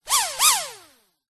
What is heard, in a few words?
vehicles drone micro motor very short whir burst